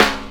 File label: Snare, Percussion Hits, Snares